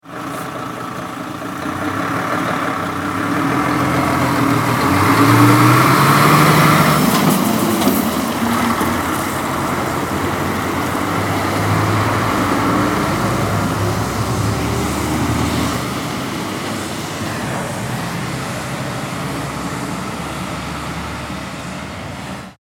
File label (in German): Verkehr LKW faehrt los